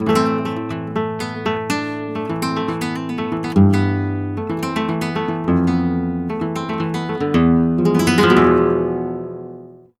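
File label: arpeggio guitar, Flamenco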